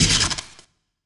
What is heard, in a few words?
Electro Bolt Shoot